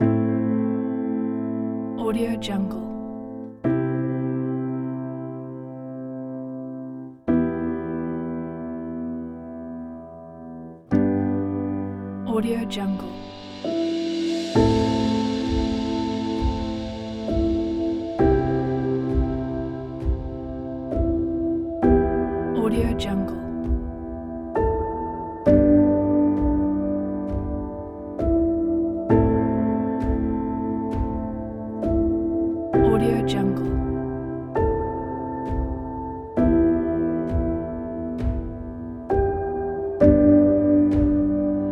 emotional moment

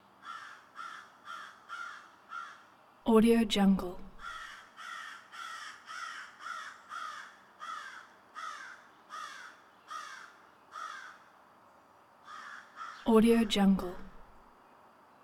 bird common crow

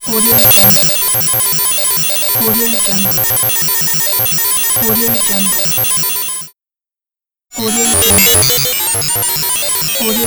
robot fast thinking; orginaljun

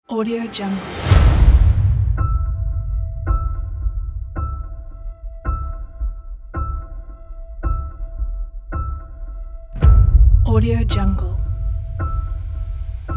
hybrid dark countdown trailer

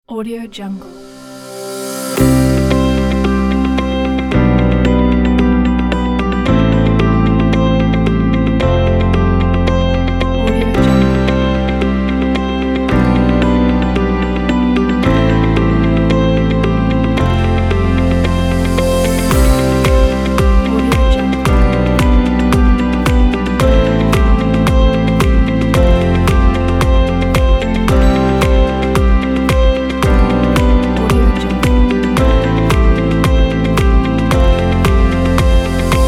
calm pop corporate

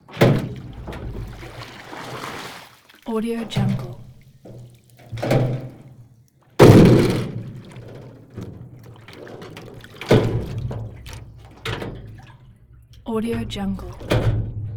water large barrel in pool; soundmorph

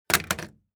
christmas present tape gun roll set down drop; esmteam

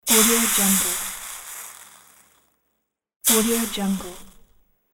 extinguish

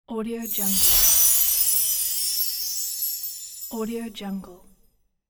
magic sparkles

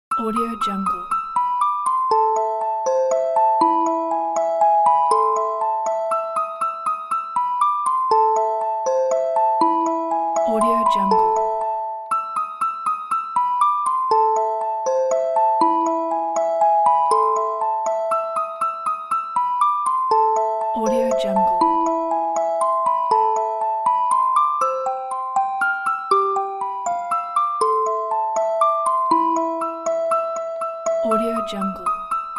fur elise music box; beethoven

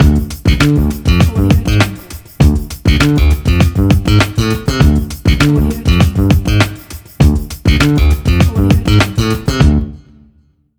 slap bass logo

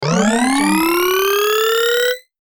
cartoon grow

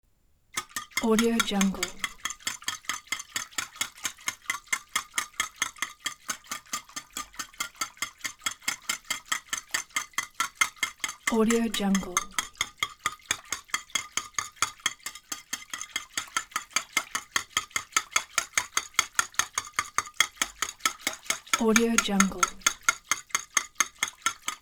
whisking

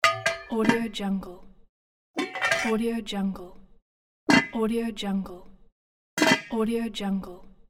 kitchen pot lid sounds; billionsounds

